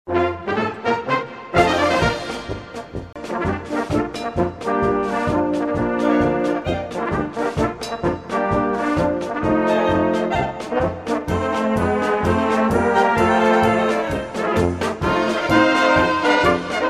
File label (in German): blasmusik